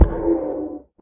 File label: guardian hit; minecraft; mob